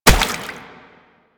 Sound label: Step Water; Warframe; Proto Infested Brachiolyst